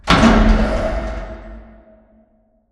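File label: Assembly Conveyor Start Distant; Boomtown; Titanfall 2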